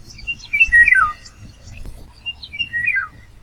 loriot d'Europe; Glissando descendant continu; Syllabe prolongée ooo Glissando descendant " \ " sur "ooo"

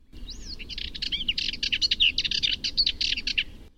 fauvette melanocephale

